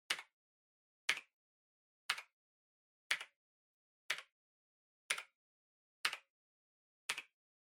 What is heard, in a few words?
PC Keyboard Far Single Mid